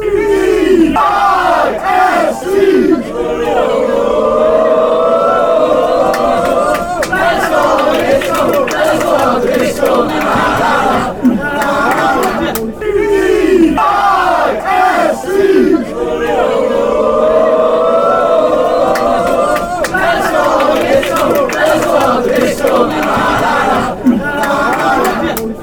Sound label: disco